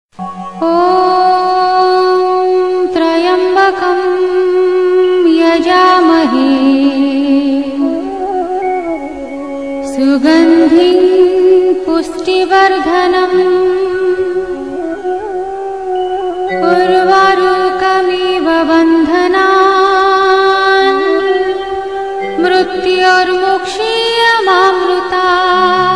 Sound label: mahamrityunjaya; shiva; Navratri Special